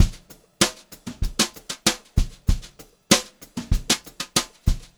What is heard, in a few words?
BEAT, Brushed Drum Loops